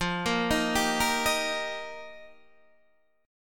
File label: F 7th Suspended 2nd Suspended 4th F Guitar Open D